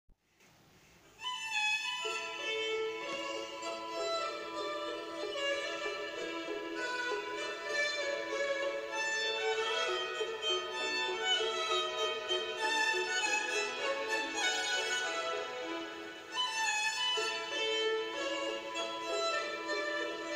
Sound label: String Quartet Sample